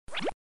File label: drip sound